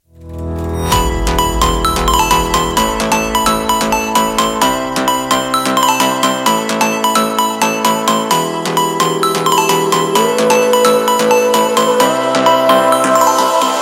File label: Brazilian phonk